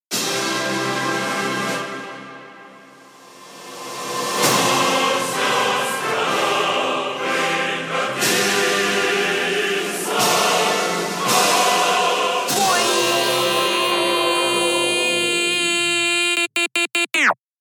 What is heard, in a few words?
41-46Hz Bass